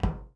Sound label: Footstep Metal; Among Us